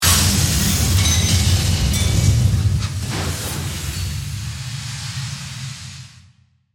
Mechanical Device, Scifi, Mechanical, Device, Operate, Movement; Spacecrafts; BlastWave